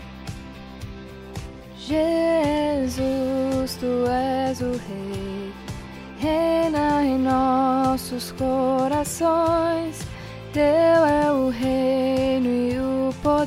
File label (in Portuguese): CONTRALTO